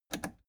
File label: plastic button scratch click enable fast